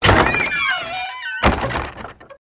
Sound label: Door Open VinePit Wood Creak; the unfinished swan